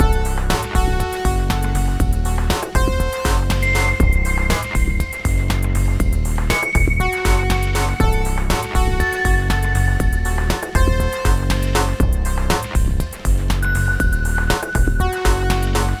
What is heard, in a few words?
loop